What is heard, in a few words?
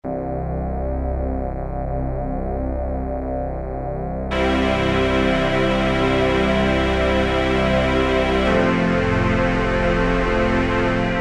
Pad, E-mu Morpheus; BallHvys